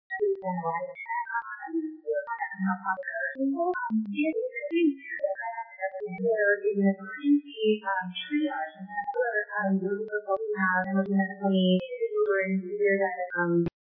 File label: music